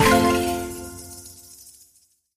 show all win; games; Aztec Gold Megaways

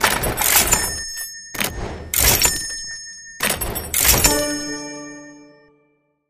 win spin animation; Sheriff Of Nottingham

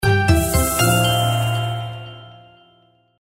intro sfx; Imperial Girls

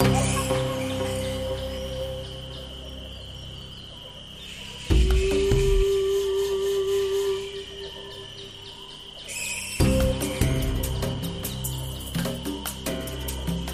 general ambiance; Wild Ape

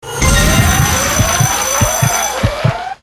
megawin; Dragons Pearl; slot

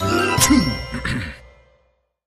selected lion; Fishing For Gold

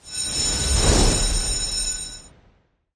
text respin appear; aztec gold; Aztec Gold Megaways

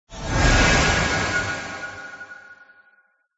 buff awake; Thunder Fighter

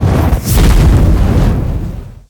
UI Fire; Hot Spin; CQ9

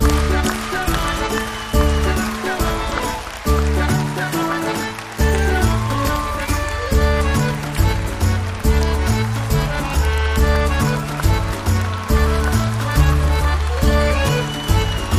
freespin ambiance; Racetrack Riches; megaboard

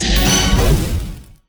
expand wild; Fire Queen

